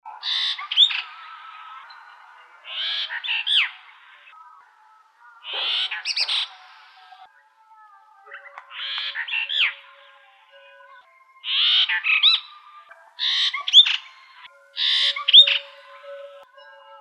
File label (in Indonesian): jalak brahmini